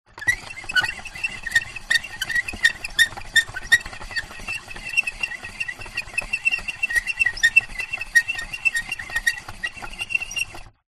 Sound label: plastic handle turn squeak fast; foley